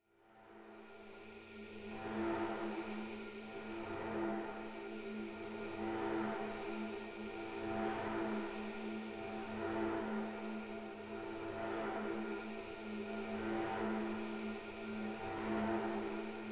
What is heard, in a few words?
Magnetic Field; 99Sounds; Magnetic Fields